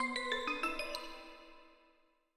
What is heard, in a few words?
open chest